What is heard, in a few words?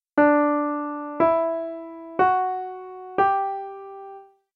Treble D Maj tetrachord up